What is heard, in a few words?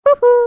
hoohoo